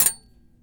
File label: Silverware Ting; BB Retail Therapy Sample Pack